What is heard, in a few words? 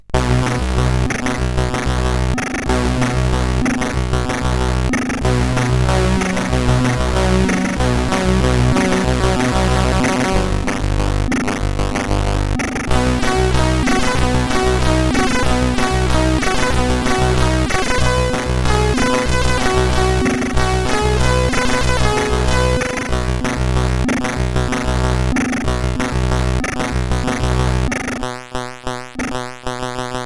keyboarder tune, CSG8580; CSG8580R5